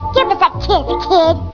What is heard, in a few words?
kiss